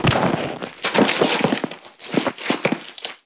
fall; BESTSHOT; noisybit

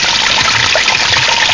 TAP; Take 2 v1.0; Water

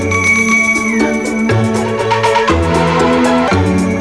jingle; L'Effet Pommier - Volume 04; Télévision; M6 jingle 2

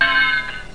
flanged, electronic sounds; Sound Effects for Multimedia - Volume 1

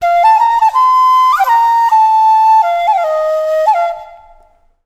flute, ethno; eJay

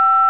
TONE

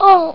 ugh

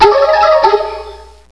Extra Life; Koji the Frog 2.0.1

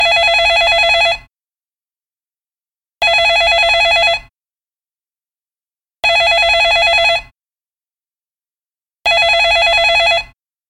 phone-bell; phoenix-end

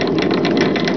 cat drop; Hexen2

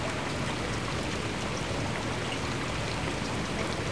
canals; KINGPIN

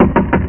knock; DRAGON20

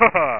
appear; Zombies

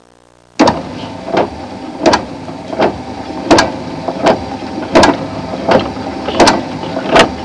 winds; Sound Library 2000; Wayzata Technology

